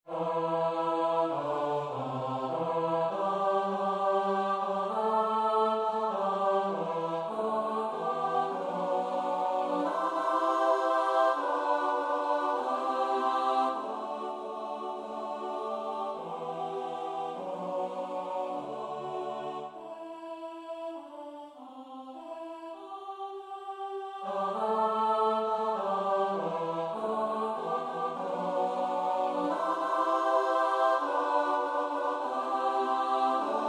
tutti

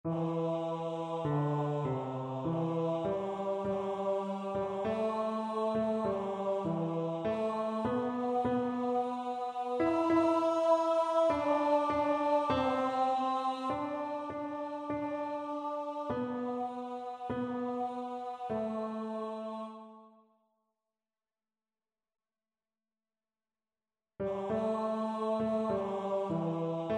tenor; O Let Us Live in Joy

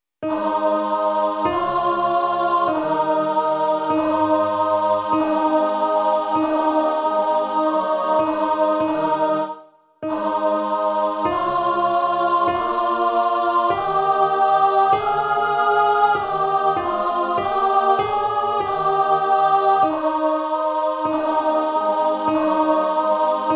alto

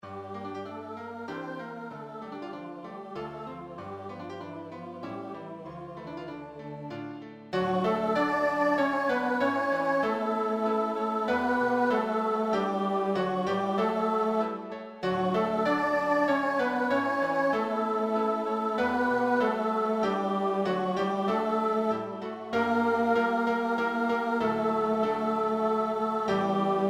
solo; A La Nanita Nana

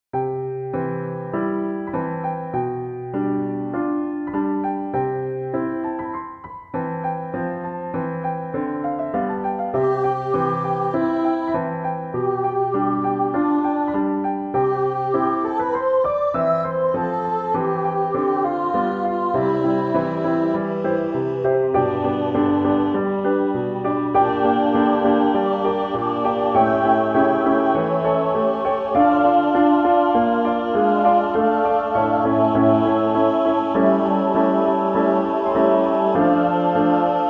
tutti; Paukenmesse 4 Sanctus

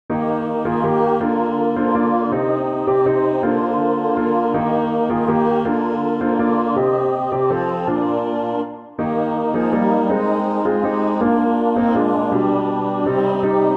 tutti

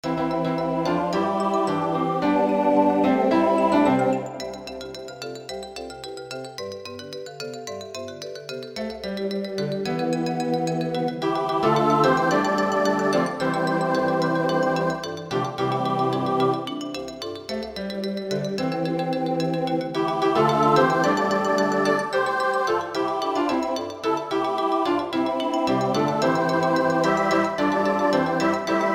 tutti fast

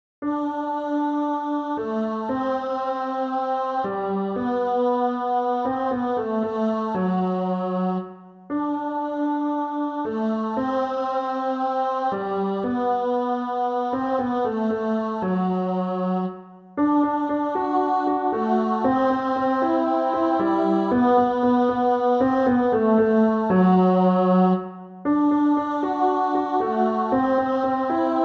tutti

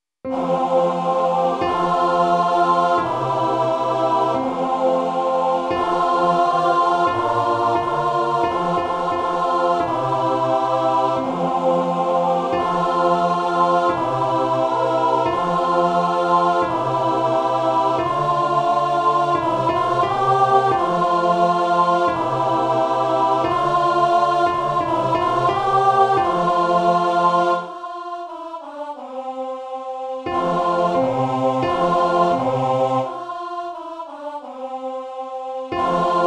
Nkosi Sikeleli alto

